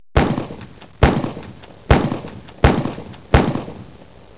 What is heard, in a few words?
GUN